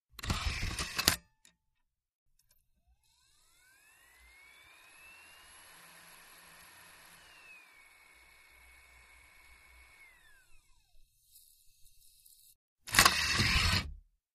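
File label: Cd Rom Tray Open Close Heavy Whir, computers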